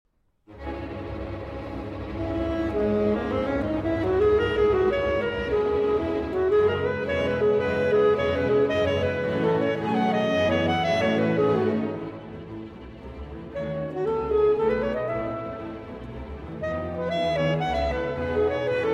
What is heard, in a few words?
Viola Concertino in D-Flat Major, for Saxophone & String Orchestra; Allegro; Op. 108